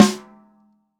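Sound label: snare, GM drum set; AKAI S-Series CD-ROM Sound Library VOL-8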